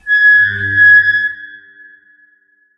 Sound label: Small ambient metal squeak; STALZONE